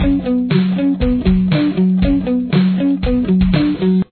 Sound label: intro guitar; drop D tuning